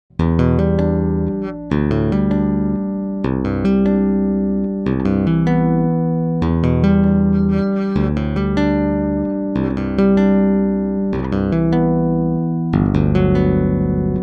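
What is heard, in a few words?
JB2 2 Hand Tapping Both Pickups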